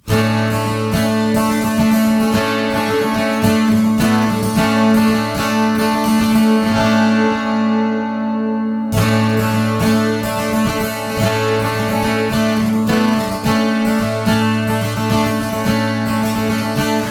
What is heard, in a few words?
Acoustics mix Left and Right